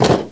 pop hit

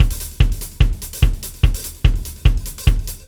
Sly Dance, Rock Drums loops; ACID MUSIC

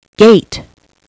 gate

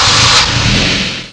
go engine; WRECKAGE

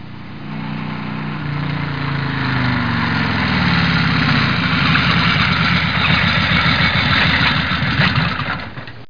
Suzuki; MISC VHCL

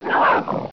WOLF; THE REALM

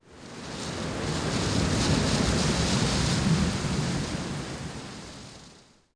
ran wind; DAoC; Toa; Gamestar

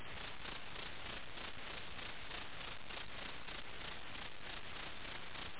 jungle noises

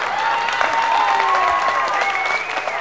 Medium Crowd; Comedians - The People Behind the Laughter

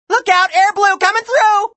zblooup; mid flight

